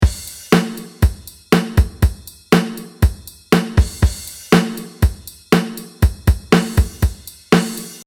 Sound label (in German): drums unbearbeitet